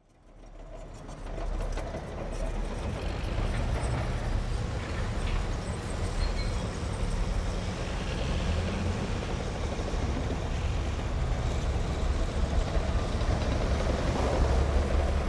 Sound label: Bulldozer Casino Demolition